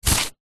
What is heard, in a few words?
stone small scrape in dry mud dirt; zapsplat; foley